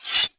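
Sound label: short blade away; The Elder Scrolls III - Morrowind; Morrowind GOTY GOG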